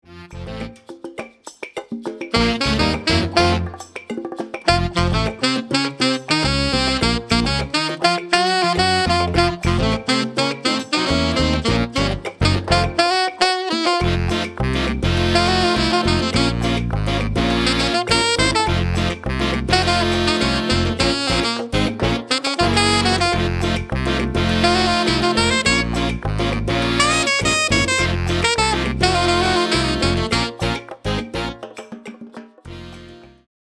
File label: Sax Ensemble; sax ensemble and rhythm section